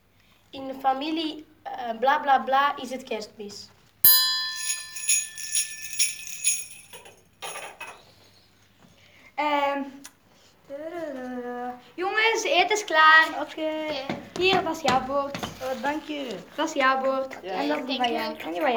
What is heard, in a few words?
jingle bell